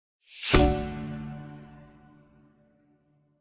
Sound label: Creature Levelup; the croods